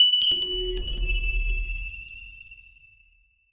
epic video game lootbox

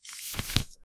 soft paper card sliding w

